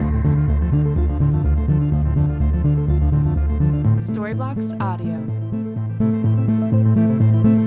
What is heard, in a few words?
Ambient House Melody Arp 125BPM D